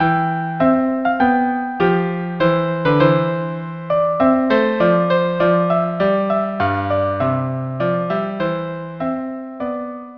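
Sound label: violin cello; La Generosite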